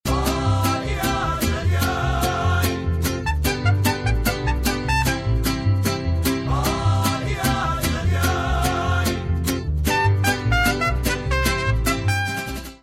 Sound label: banana long; Fruit Fiesta; Wazdan